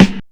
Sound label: clear snare drum g key; snare drum samples